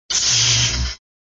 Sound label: Sound SFX, flipper; sttng